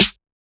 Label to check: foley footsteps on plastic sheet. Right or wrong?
Wrong